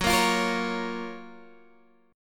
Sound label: Gb Major 7th, down, Guitar, Standard; GbM7 strummed; JGuitar